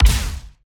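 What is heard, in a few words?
Laser gun Ballista Fire Short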